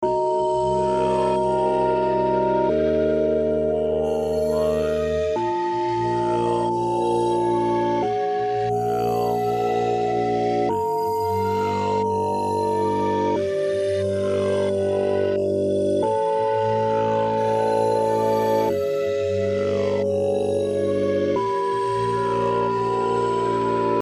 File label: dark atmosphere